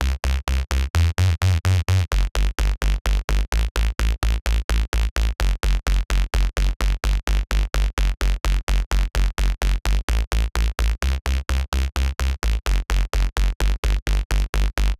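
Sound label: powerful electric funk bass, funk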